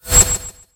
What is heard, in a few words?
Stellar Slime Constellation Wave; Servants of Necroterra